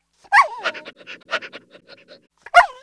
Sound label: Dog Bowwow; Seer; GamigoNA